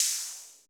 perc, Roland MC-909

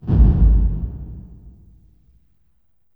LH K808, REVRB, LARGEHALL808

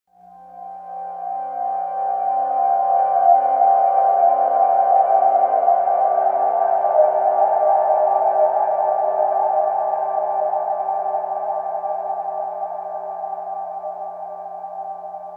PLASMA FIELD; Sonic Foundry (Sony Creative Software); Vir Unis Infusion

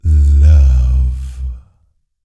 Vocal Planet R&B Dance BASS CATCH

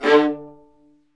String Sections, Violas Marcato

